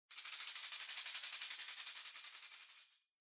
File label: Tambourine Roll